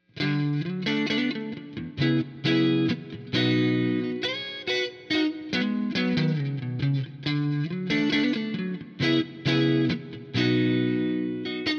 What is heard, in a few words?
strat marshall; UAD; marshall sl